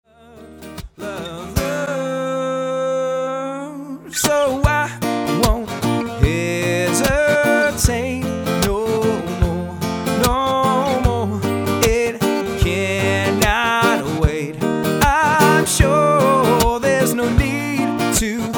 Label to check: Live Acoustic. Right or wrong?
Right